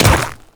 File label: Ice Step; Enemies; Footsteps; Warframe; Grineer Royal Guard